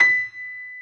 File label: PF STB B 5, S GRAND PF